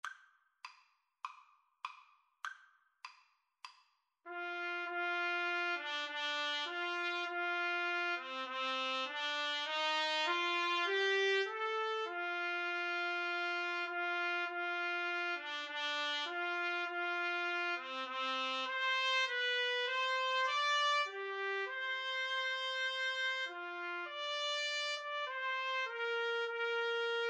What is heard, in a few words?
Trumpet Trombone Duet